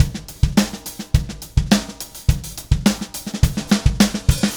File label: Beat Fill; Sonic Foundry (Sony Creative Software); Indie Rock Anthems; Session 02 - Clinical